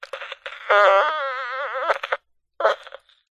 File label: cb radio cheap feedback through speaker raspy